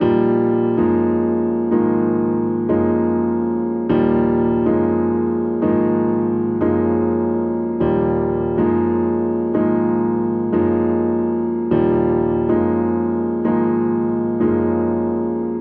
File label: Progression Nuptempo Eb - GbMaj7 - Ab69 123 bpm, Grand Piano; MIDI Clips; Ableton; Factory Packs